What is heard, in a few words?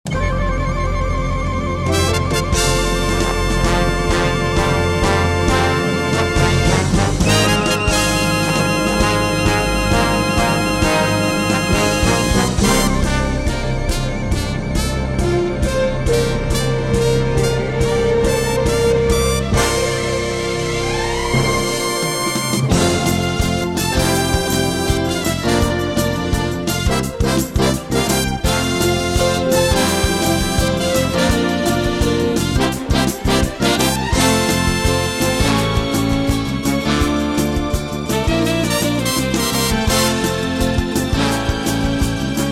French Opener